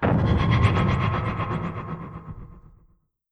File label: tardis delete; p00gie